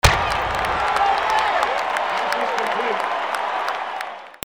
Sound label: CROWDS